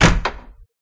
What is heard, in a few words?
Open; Life of a Naomi